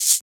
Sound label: Shaker; FLPRO